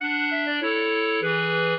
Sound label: clarinet; Mozart